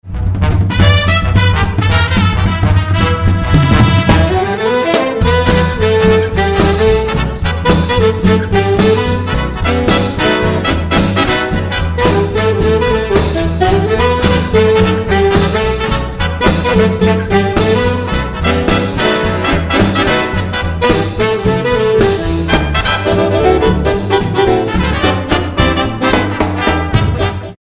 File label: Dance Song